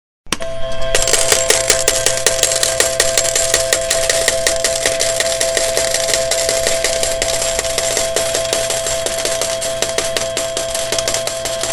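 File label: sounds of casino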